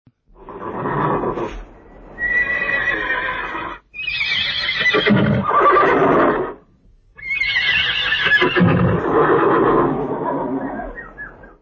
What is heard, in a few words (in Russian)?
rzanie loshadi